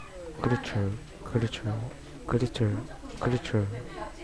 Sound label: creature